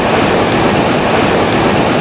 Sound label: flamethrower burn